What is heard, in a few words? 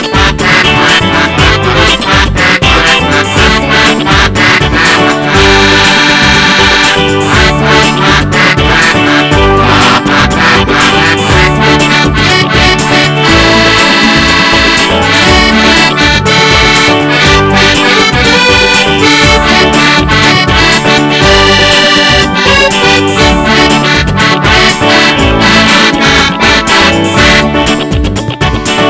Unique Hot Pop Instruments